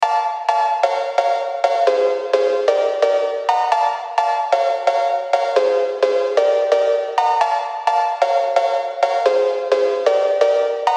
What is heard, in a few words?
kitty phonk